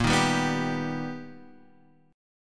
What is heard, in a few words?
B Augmented Major 7th A sharp, chord